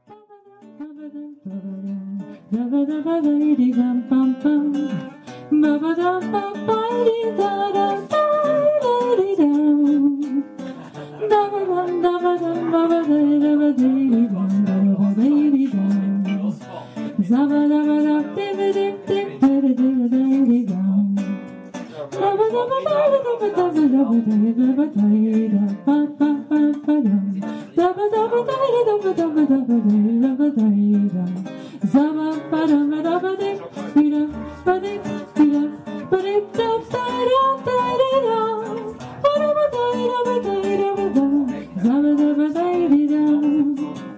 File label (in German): scat mit guit